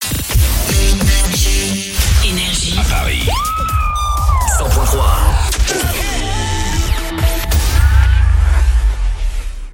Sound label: JINGLE; PARIS, 100.3 FM; NRJ